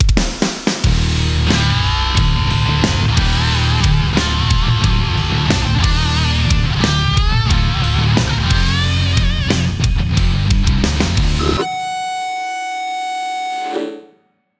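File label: Rock with Solo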